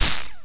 lightning hit, weapons

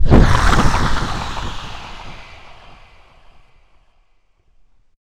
falling; player

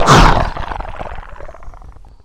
death; player

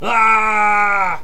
falling

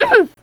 pain; player

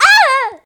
pain; player

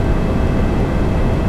fan

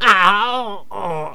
death; player

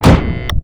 grapple fire

